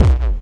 Kick, Trance; Sonic Foundry (Sony Creative Software); Trance NRG VOL-2; Drums

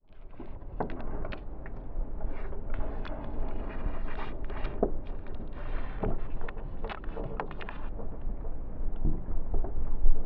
Hydrophone, Underwater, Small; underground sounds

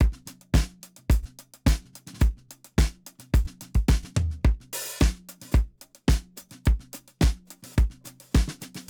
70 s Vibe 108 bpm, drums; Basement drums vol 1

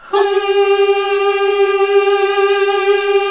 HMM G#2, classic vox

